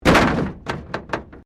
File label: Wreck Door Panel Clunk Metal